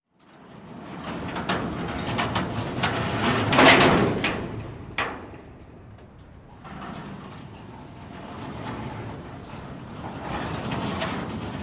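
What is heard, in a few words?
Metal Gate Open Close Medium, metal gate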